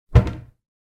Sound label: cabinet door close